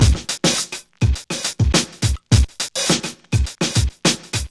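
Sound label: 104 bpm, Funky loops; Zero-G; Funky Elements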